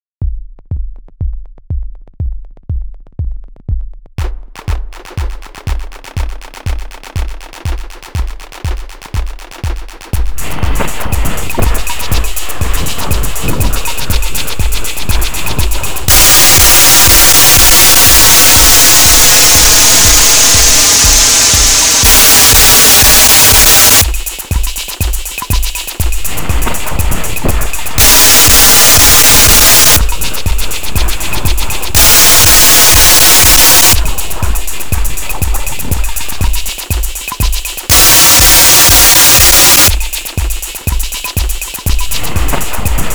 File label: White Noise